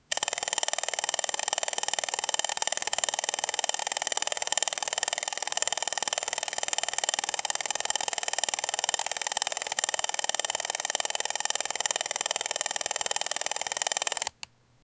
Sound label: a rapid continuous sequen